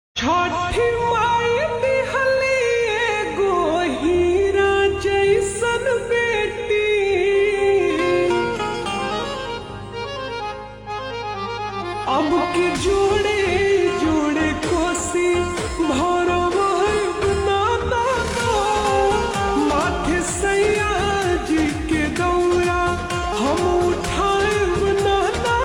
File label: Slow Reverb Version Ringtone